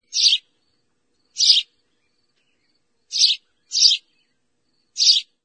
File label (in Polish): wrobel